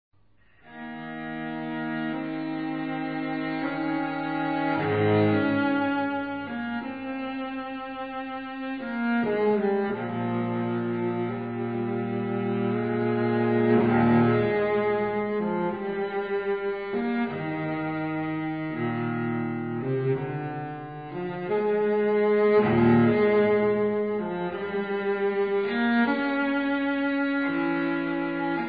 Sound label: Suite nr.4 in E. Flat Major; tortelier; Suite 4, BWV 1010, Sarabande